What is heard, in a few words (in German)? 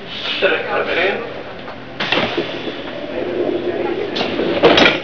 UBAHN